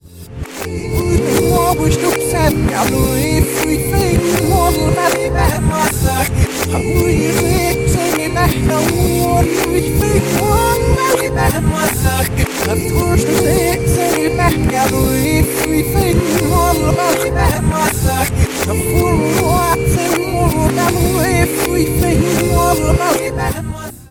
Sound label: song reverse